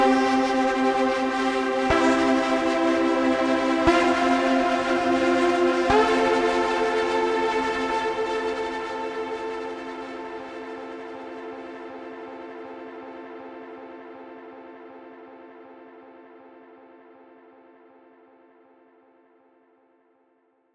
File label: atmo; air vaccum pro